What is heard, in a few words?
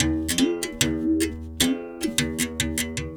Tar, World Percussion